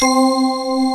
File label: LINEAR SYNTH